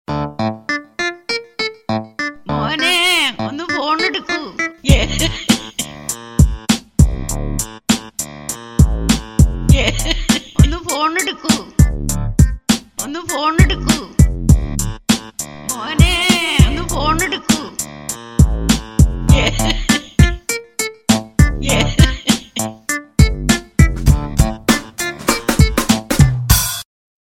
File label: malayalam funny tone